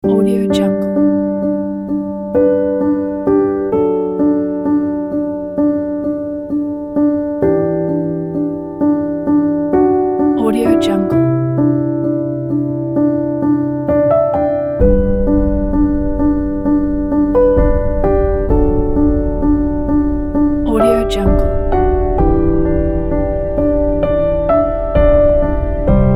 ambient piano

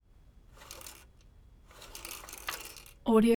lawnmower rolling by hand various short bursts

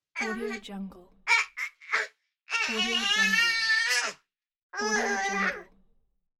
angry baby

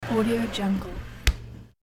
freezer door close; hollywood edge

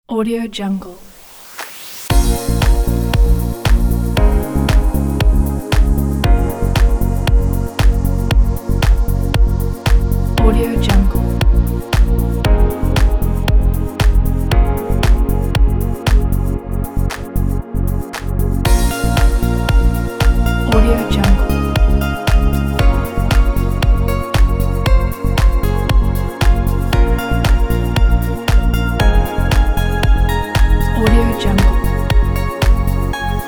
after the rain calm background music

